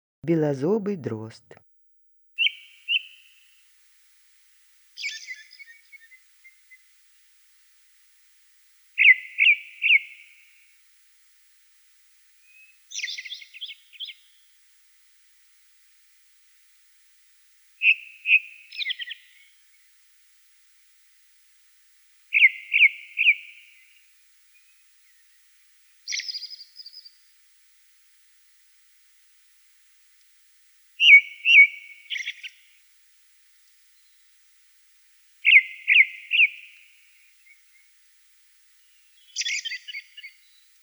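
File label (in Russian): belozobyy drozd golos, ring ouzel voice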